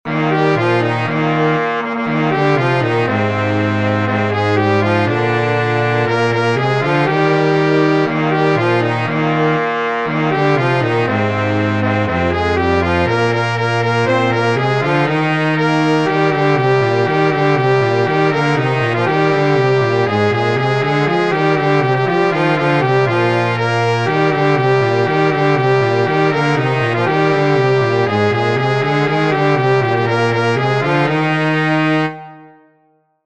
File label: jingle bells